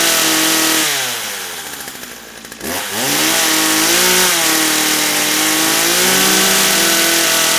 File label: Chainsaw Loop; Game rip; MiSide